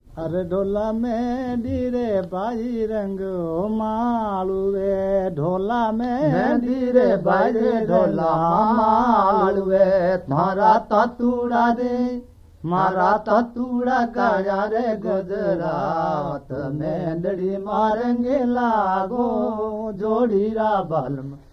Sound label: sung mehndi